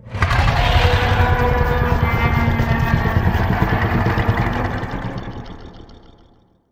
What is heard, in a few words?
dragons drive by; sfx; tyranthor